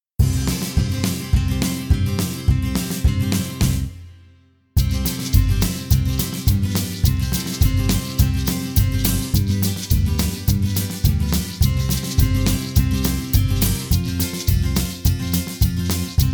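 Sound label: backing track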